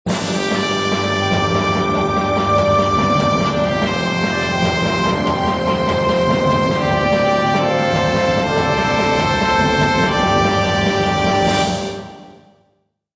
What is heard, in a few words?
Medieval Combat; Civilization Revolution; Xbox